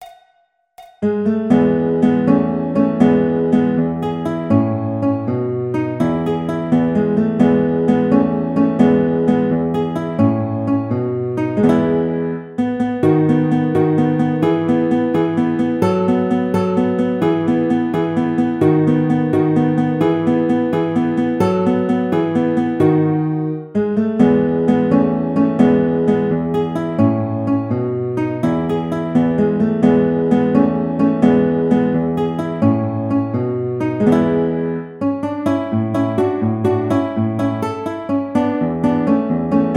Allegretto Guitar, classical; Carulli Op 241 No 14

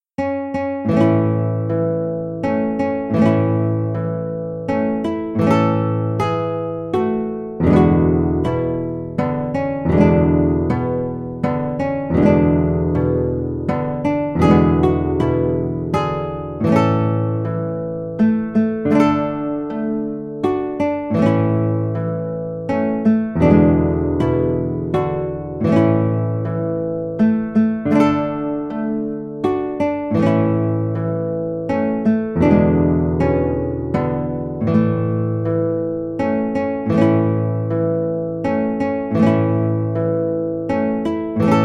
Lullabye Guitar; Brahms

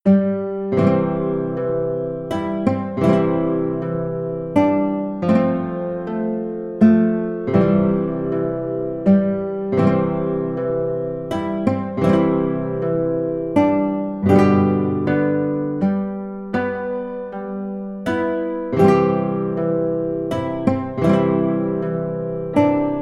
Amazing Grace Guitar

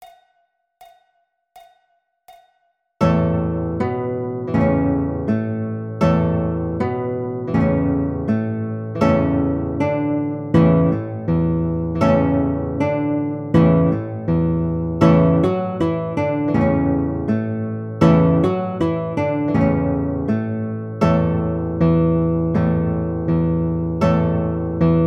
Frere Jacques Guitar

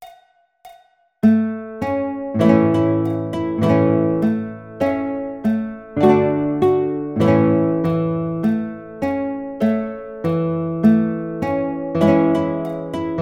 Kumbaya Guitar

